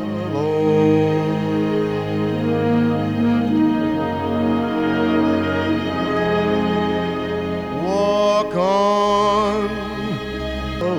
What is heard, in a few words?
Walking Horns